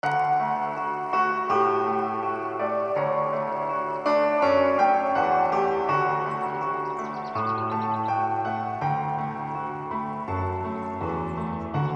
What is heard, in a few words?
164bpm, Beats